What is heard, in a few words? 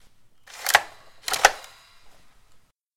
machine diapo